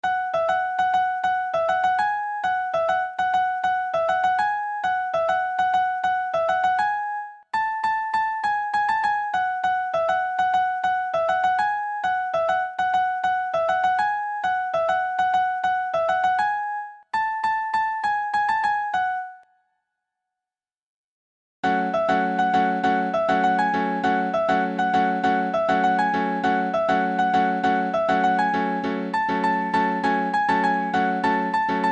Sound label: Piano